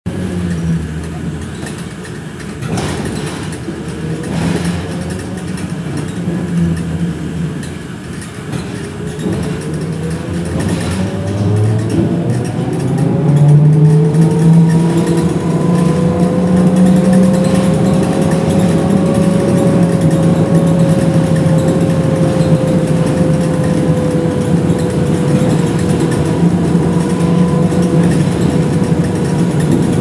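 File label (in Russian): звук автобуса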